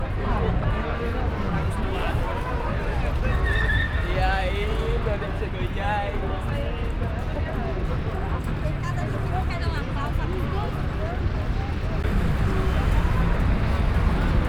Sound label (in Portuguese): Na Rua, Fieldrecordings Brasilien